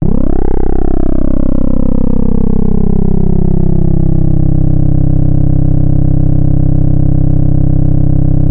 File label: C1, Korg MS-2000; Synthworx